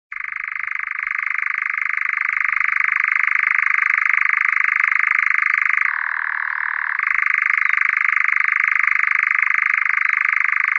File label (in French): chant